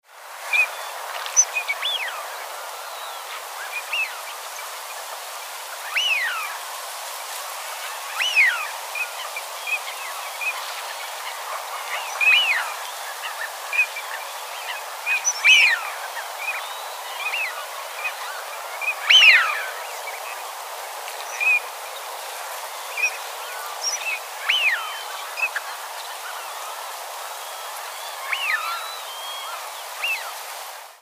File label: wigeon teal trimley marshes